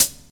muted hi hat sample f sharp; muted hi hat sample f sharp key; hat samples

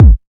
studio kick single hit a key; kick drum samples

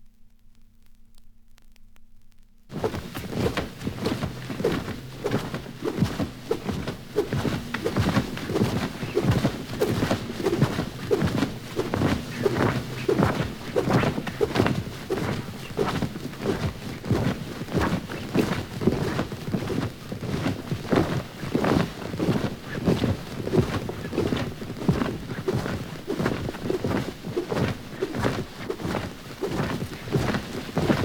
dracula flying vampire

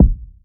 urban kick drum one shot c key

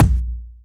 silky bass drum single shot b key; Duration 0:01; kick drum samples

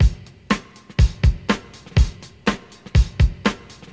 122 bpm hq drum loop A sharp key; drum loops

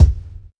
subtle reverb kick single shot D# key; kick drum

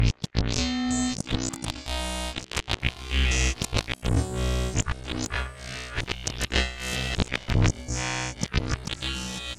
crazy sequenced texture synth 100 bpm; instruments; loops

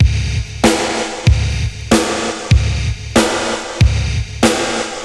95 bpm breakbeat sample G sharp key; drum loops

